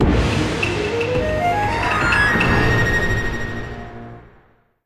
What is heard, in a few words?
Jingle Final Battle Start; StreetPass Mii Plaza; Ninja Launcher